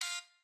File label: Turret Button